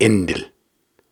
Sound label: Syllables and Imaginary Words, Viking Vocal, Hard